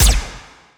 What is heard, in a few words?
Pistol Fire Longer Tail; Gunpis; Robobeat